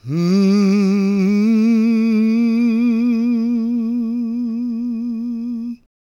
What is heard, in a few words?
GOSP MALE, SOLO